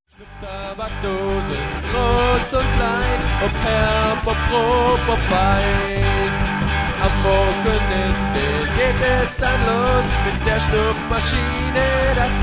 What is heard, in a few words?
rock